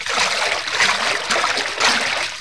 Water, 自然, 水声; 特酷声音素材库

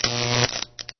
Neon; 暴力赛车

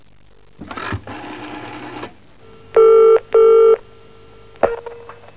PHONE, 电话; 特酷声音素材库

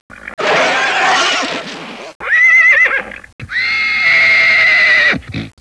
HORSE, 家畜, 马; 动物; 特酷声音素材库

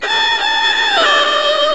Game, 游戏音效; 特酷声音素材库

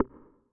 blip; 18 button and menu sounds

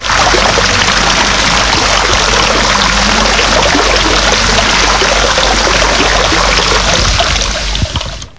Water, 自然, 水声; 特酷声音素材库

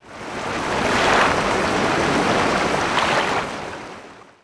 Water, 自然, 水声; 特酷声音素材库

